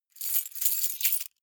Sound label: key ring large rattle hard; objekte; schluessel